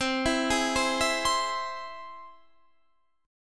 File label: C Augmented, Guitar Standard, chord; arpeggiated; JGuitar